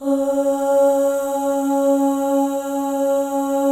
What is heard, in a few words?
OH, FEM, Symphony of Voices; Spectrasonics